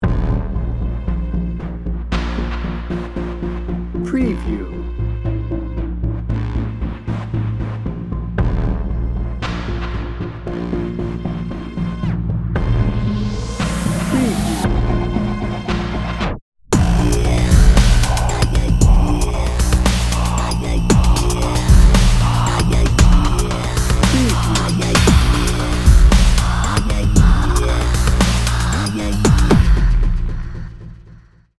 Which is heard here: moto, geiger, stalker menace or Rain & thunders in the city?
stalker menace